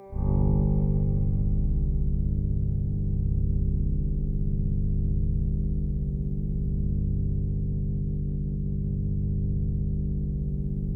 C1, Synth Line; 90 s Sample CDs; NiceBeats; SynthLine VOL-32

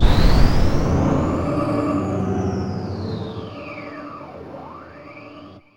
metal bomb, synth bombs; Zero-G Ambient 1

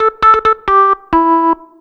2 Step 133 SYN, House

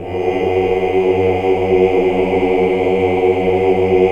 OOH. OOH UNI; Hallelujah

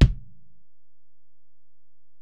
Ac BD, Alesis DM-PRO; 300 Drum Machines

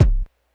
kick, Hardcore Breakbeat; Big Fish Audio; Hardcore Breakbeat Action

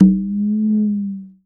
CONGA GLISS; AKAI S6000 CD-ROM - Ultimate

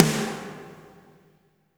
SNR; Spectrasonics; Burning Grooves CD2; SLAM BALLAD

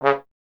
Trombone C#; Miroslav Vitous - Solo Instruments VOL-1

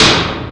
Clank, Industrial; Sonic Foundry (Sony Creative Software)

